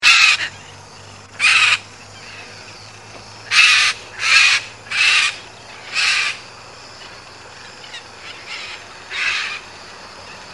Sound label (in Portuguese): canto da maracana guacu